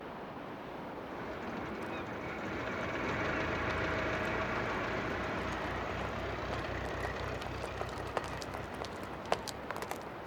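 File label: Kent hotel exterior morning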